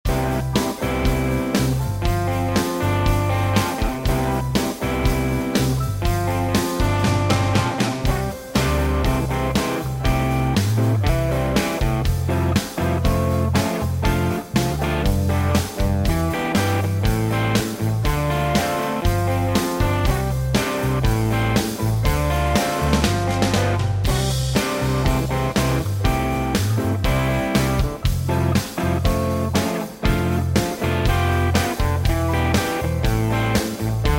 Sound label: Blues